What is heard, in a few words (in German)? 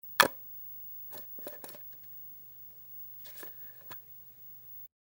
Metalldeckel auf zu